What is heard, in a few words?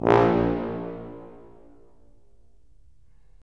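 brass tuba; LA Composer vol. 3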